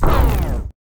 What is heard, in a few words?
wing; Mob